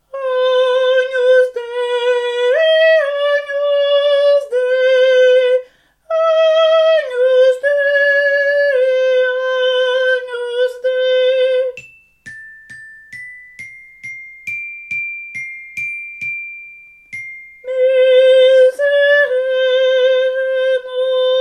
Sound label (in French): tenors; charpentier; agnus dei